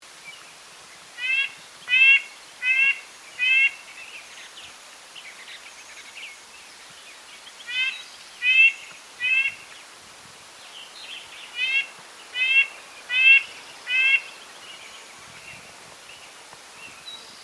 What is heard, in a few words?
Red breasted Nuthatch Sitta canadensis